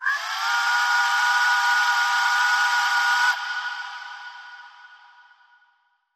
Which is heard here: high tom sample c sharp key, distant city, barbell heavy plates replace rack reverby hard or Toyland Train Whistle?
Toyland Train Whistle